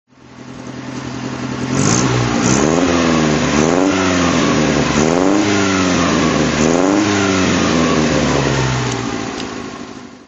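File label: MG PA throttle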